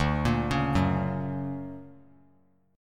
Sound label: D Augmented Major 7th down; D+M7 arpeggiated; JGuitar